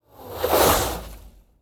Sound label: skate swoosh fast